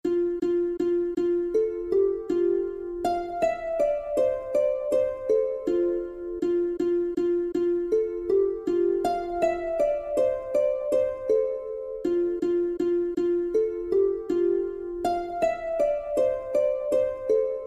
Harp Melody Loop

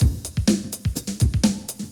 Drum Kit Loop 1 bar 125 bpm; Mixter Plus Drum Kit; Modmid

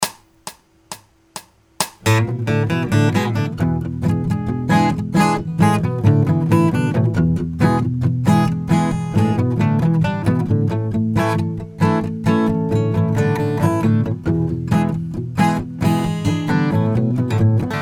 ping ding

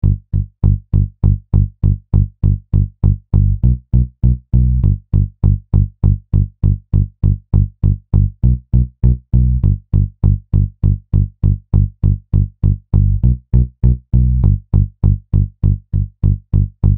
Gm Rock Groove; admiralbob77

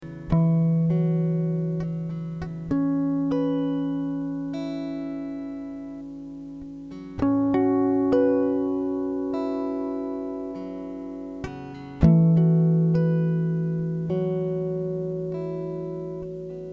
Em 100 GTR Harmonics

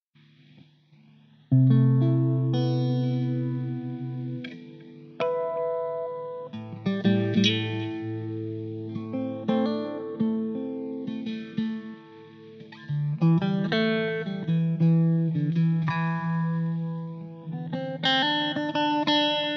Am112 GTR impro

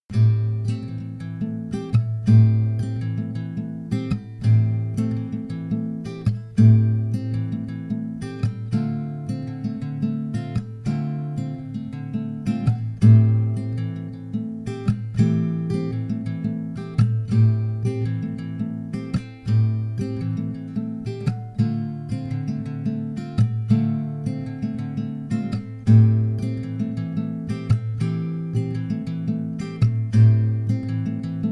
Am 112 GTR arps

